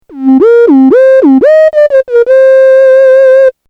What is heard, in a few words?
moog satellite lunar